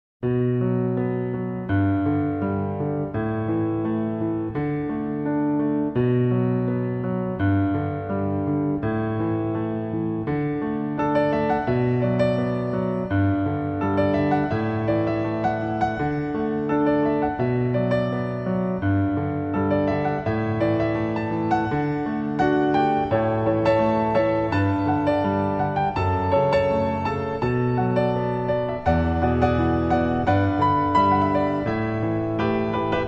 讲故事的背景音乐; 3分以内

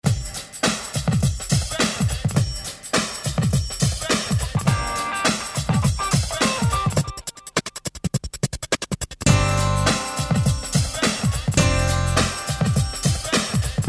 片头音乐

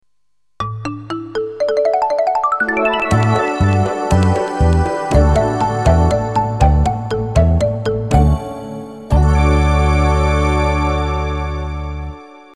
电视包装音乐

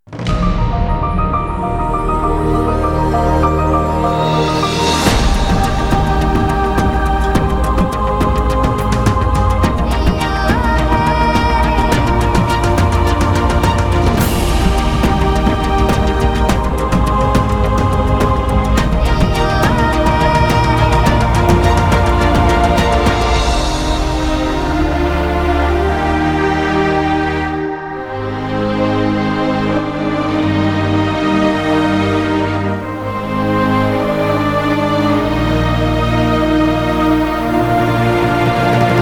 企业宣传片背景音乐; 3分以内

